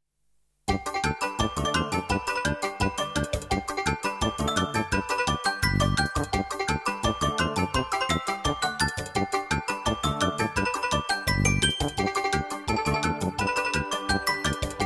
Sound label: Jingles; 90 DDD Jingles